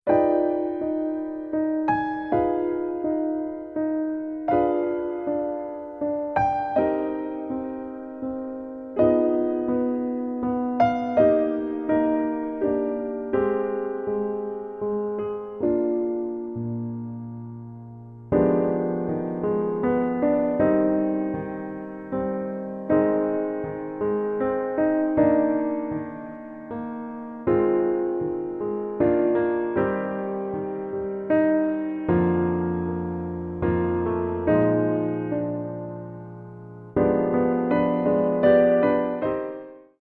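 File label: I Need Thee Every Hour, piano low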